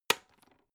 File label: electric whisk insert hook hard; haus-garten; kueche